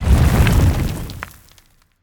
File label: dirt rumble; smite; khepri